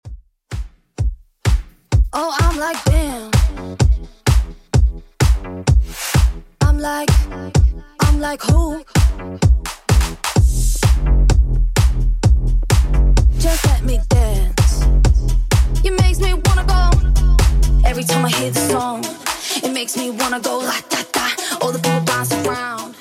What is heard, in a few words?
Top40 Redrum 128bpm